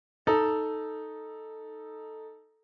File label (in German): Verm Quinte Dis; Reine Verminderte Uebermaessige Intervalle